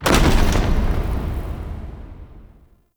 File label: Drawbridge Stop; UnderCity